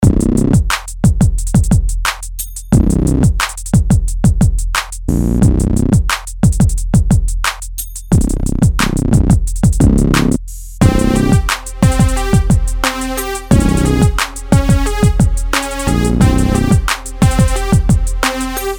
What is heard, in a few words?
Hip Hop